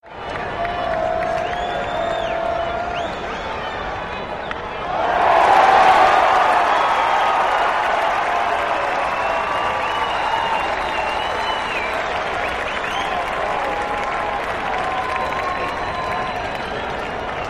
Arena Crowd Cheer, sports arena

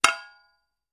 Metal Impacts Dull, pipes

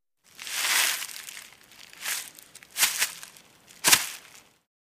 Footsteps Grass Scuf

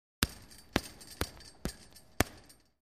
Boxing Punching

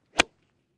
Golf Ball Hit W 3 Iron

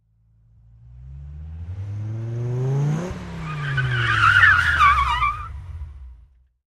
Accelerate Quickly, sports car

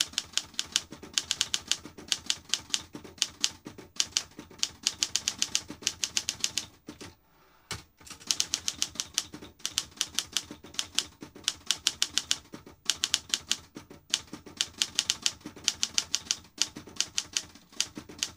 Typewriter Manual; typewriters

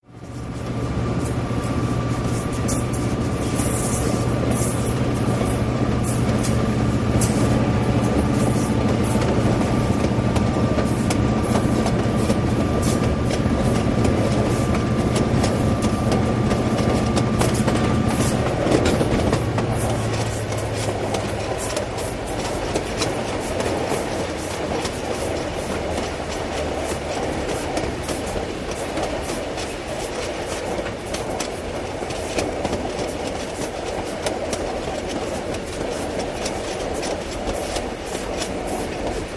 Train Run Engine Off, diesel

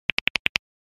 Clicks Multiple Rap, switches

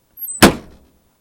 BMW Trunk Close

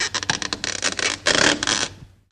Ice Creak Squeak Natu

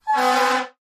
fire engine horn; fire engines

